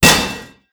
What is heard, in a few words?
Panel Shower Hit; Among Us